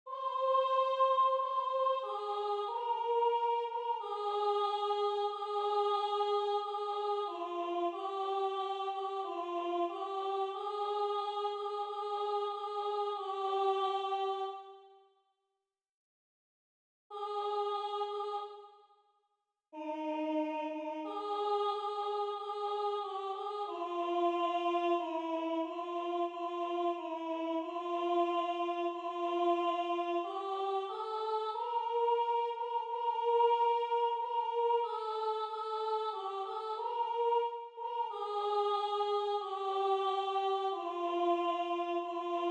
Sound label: alto; Grassi; Justorum